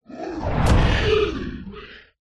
horror monster creature impact growl squeal

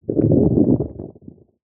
human stomach rumble squelch

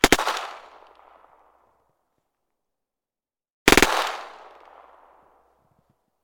gun ppsh; still north media

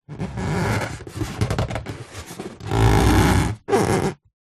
foley polystyrene hand rubs creaks; zapsplat

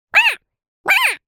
cartoon bird parrot squawk

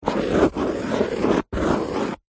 office pen draw shape on card back of paper pad; zapsplat

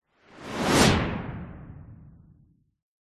sound design whoosh fast rustling; zapsplat

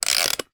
household music box single wind

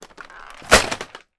household vacuum cleaner upright fall over; sound effects one

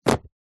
household books pile set down on surface

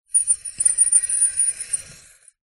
foley metal sharp scrape squeak shrill

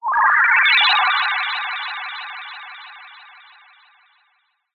cartoon sci fi data particles transmission; zapsplat

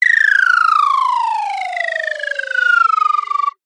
cartoon slide whistle descend fall stutter fast

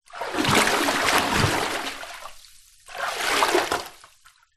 household bathtub water movements; zapsplat

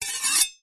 knifes medium scrape blades together

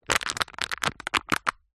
household plastic cup disposable handling

